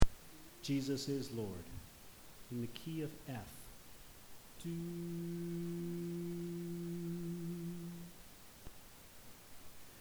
Main Echo Part

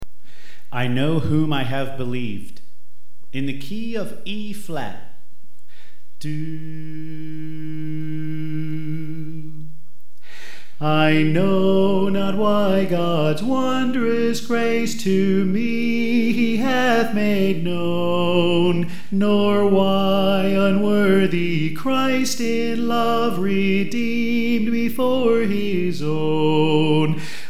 Soprano; I Know Whom I Have Believed

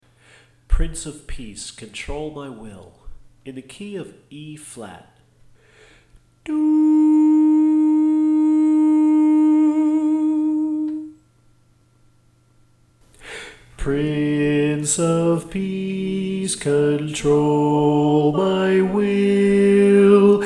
Bass

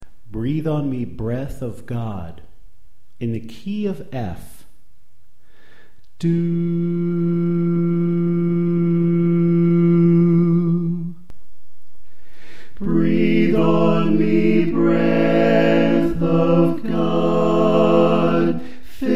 Soprano; Breathe On Me Breath Of God

